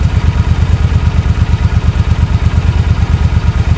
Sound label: suzuki c40 idle, motorcycles; mono mic